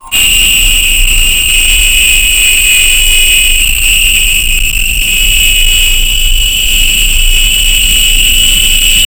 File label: glitch